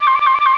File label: flute